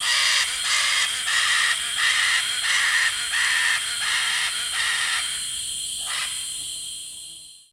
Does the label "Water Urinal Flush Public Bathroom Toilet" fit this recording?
No